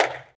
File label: step; Mr. Upbeat